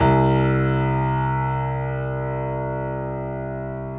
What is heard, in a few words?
PNO XYPNO, Grand Pno, Roland; Club-50 - Foundations; Grand Pno 3